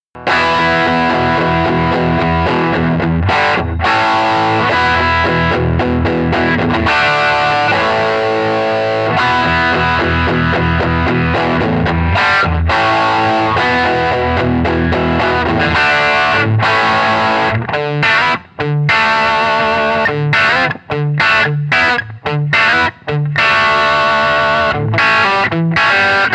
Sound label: Les Paul; Harvard